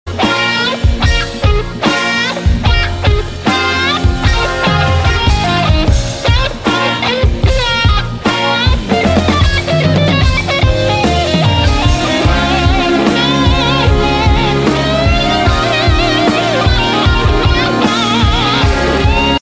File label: unplugged ringtone